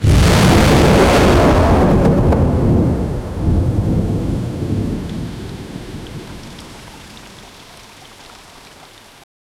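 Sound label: big body of water sloshing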